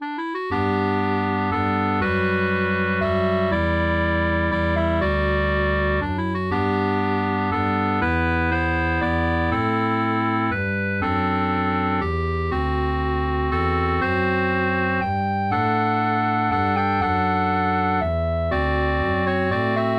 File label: Waltz Melody Bass; Pytala Sie Pani